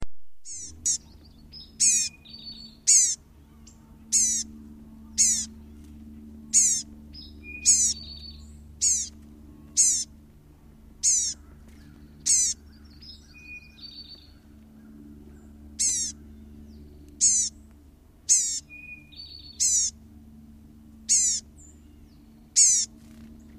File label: Blue-gray Gnatcatcher Polioptila caerulea